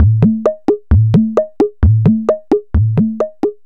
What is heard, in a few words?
super toms; Music Store Sound Service; Einstein